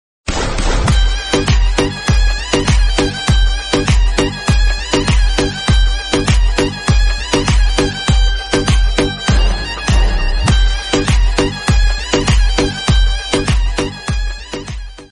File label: cool instrumental background music mobile tune ringtone